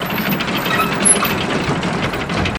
Lift Wooden Pulley Loop; Lucius III

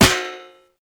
Smack fry pan; littlebigplanet 2 ps3 gamerip 2011

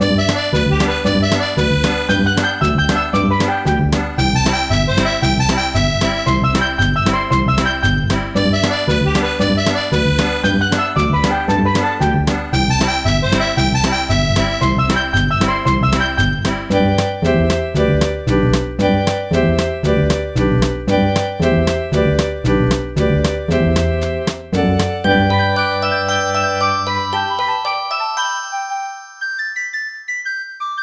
Splash Screen Music; SpongeBob SquarePants The Yellow Avenger; psp

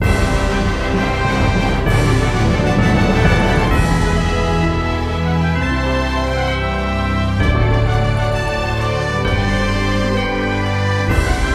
Victory!; tales of the abyss 2005 ps2 gamerip

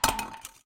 Drop Plastic; Lethal Company

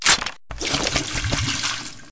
reload flame Clip In

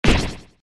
Sound Hurt; Drop Wizard

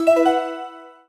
News Arrived

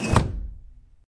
Small Hatch Door Open; Lethal Company; Windows

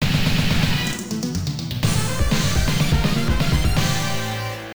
Whoa! (Bonus Stage Clear BGM)

